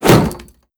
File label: shield light bash; Skyrim Special Edition